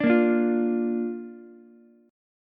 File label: A sharp Major, Ukulele; strummed; chord; JGuitar